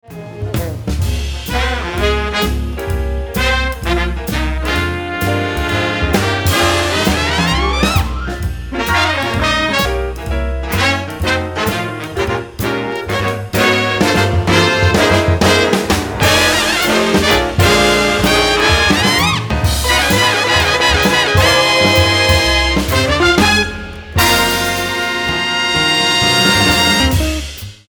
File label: trumpet